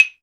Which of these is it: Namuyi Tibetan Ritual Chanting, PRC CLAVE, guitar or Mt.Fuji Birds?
PRC CLAVE